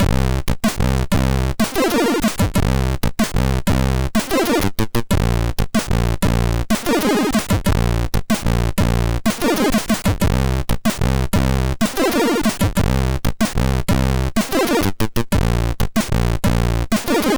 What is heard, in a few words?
Power sid MOS6581R3